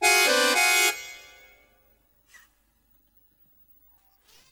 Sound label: Noisy Accordian; pingu the original soundtrack